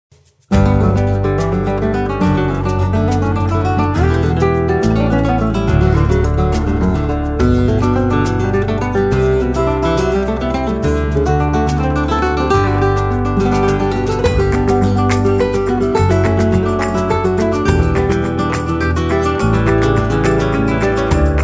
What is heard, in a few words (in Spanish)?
Falseta, Flamenco